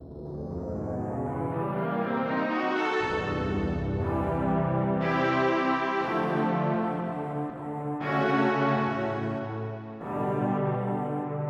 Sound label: Slow Strings; FF7